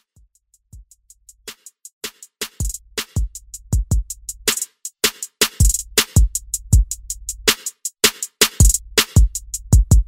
Magic City Cowboy Country Redrum

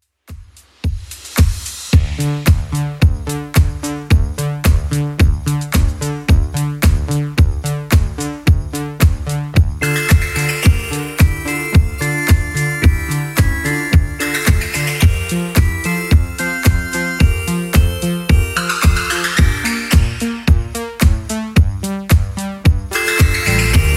80s Clubmix 110bpm

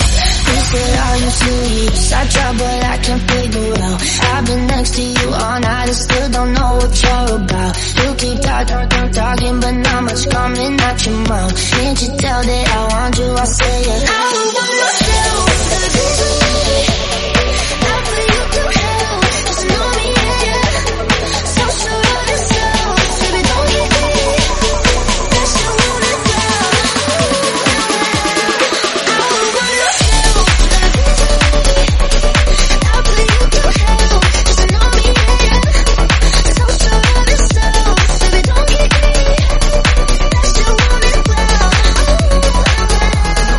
128bpm Bm

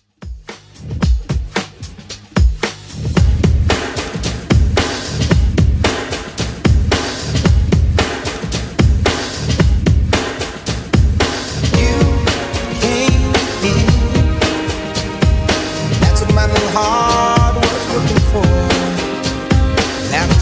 90s Redrum 112bpm

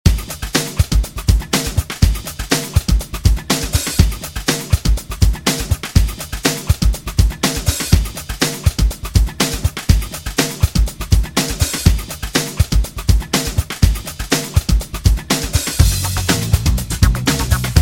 80s Dj Intro Outro 122Bpm

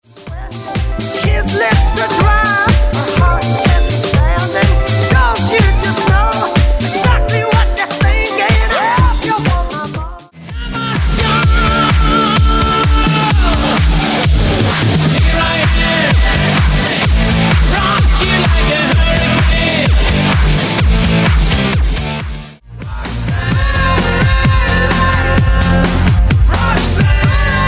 80s 90s Rock Megamix

